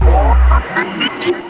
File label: Wind Up; Jungle; FX; Blu Mar Ten - Jungle Jungle - 1989 to 1999 Samplepack